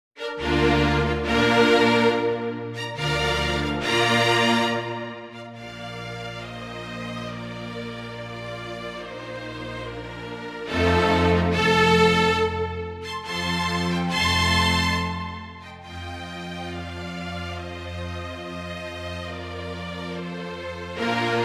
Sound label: ballet-pantomime, MIDI version; ninette a la cour